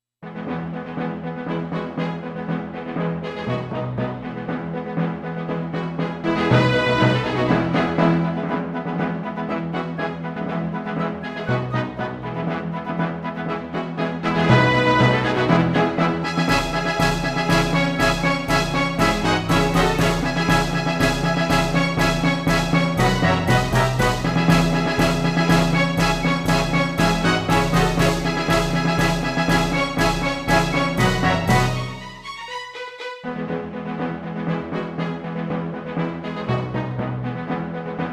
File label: Orchestra